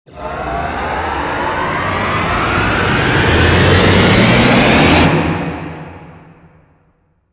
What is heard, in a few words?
rise box